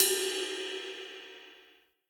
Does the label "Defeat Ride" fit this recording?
Yes